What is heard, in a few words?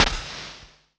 Hihat Closed Wrinkled